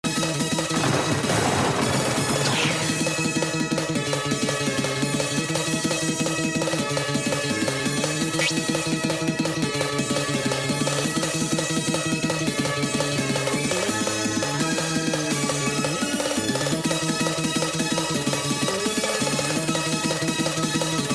90s stock

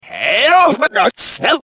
party start

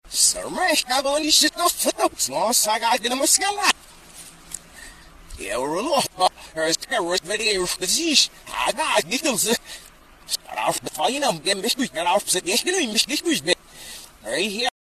polaroid

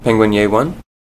penguin yay

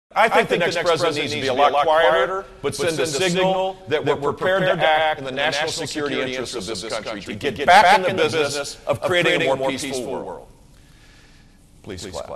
please clap

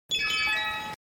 flashback

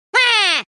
WAA

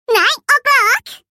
clock

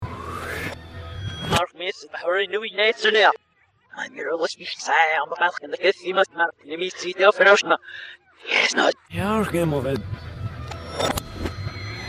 devils cry, reverse

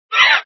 crowd gasp

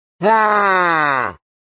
WAA

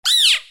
Goal

